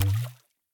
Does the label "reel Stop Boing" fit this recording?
Yes